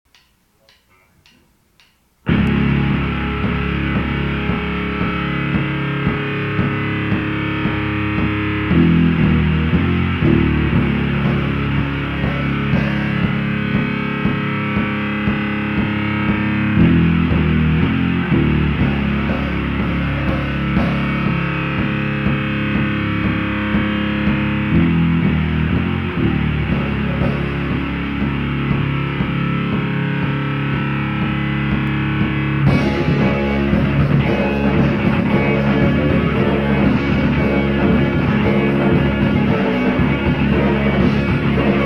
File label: Instrumental; rock